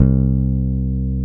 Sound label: Basses, Roland